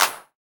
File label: CLAPS HIP, CLP